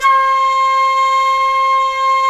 WND FLT MT, Flute & Mute

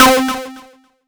C3, Synth Line; 90 s Sample CDs; NiceBeats; SynthLine VOL-1

